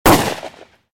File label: Shotgun - Shoot; RadwinIsland